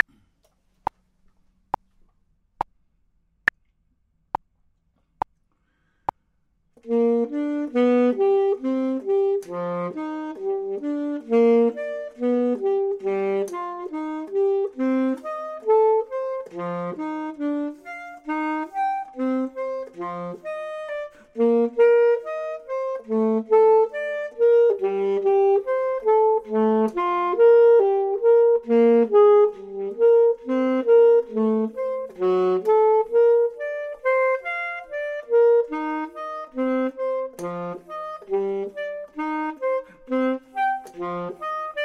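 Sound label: bottom, Saxophone and Clarinet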